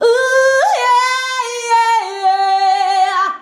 "UUUUYEAHH", FEMALES; Best Service; Hyper Dance

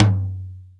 DRY TOM1; Greytsounds; Scott Peers Sound Engineering

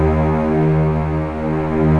STR f, STRING; Best Service Gigapack 1

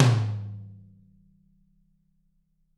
TT, Toms; Bob Clearmountain Drums I

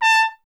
TP A; Miroslav Vitous - Solo Instruments VOL-1; Trumpet

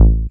DR. LOOP, BASS; X-Static Goldmine 3

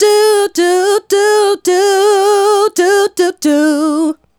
Female Solo, Vocal

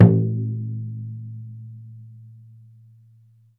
DA PAI GU, CHINESE PERC; Spectrasonics; Heart of Asia

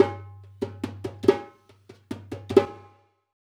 Dumbek; Essential Percussion

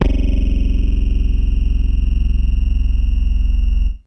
Electric Bass, FAIRLIGHT; Retro Sampler

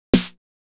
Snare, Snare Patterns; Beat Components

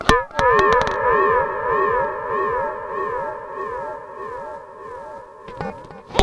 SPACEY L, Funk Guitar; Zero G - Funk Guitar

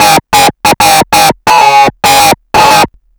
DISTLOOP, Big Beat Samples

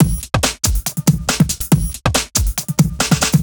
LoFi Beats, 140 BPM

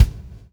PULL, Backbeat; Spectrasonics - Backbeat

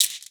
CABASA; Xsample VOL-9

